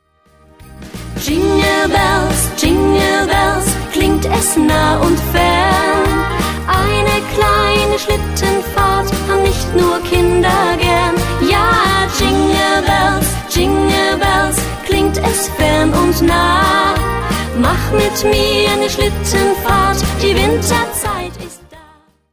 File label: Jingle bells